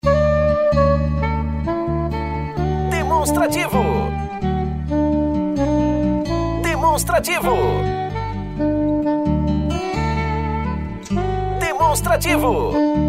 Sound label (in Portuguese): tema espera